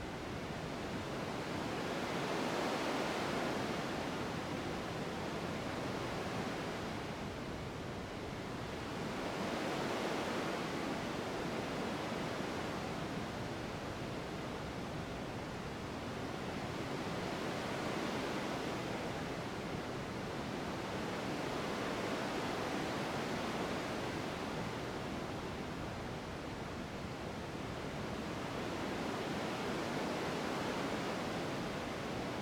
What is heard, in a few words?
sea noise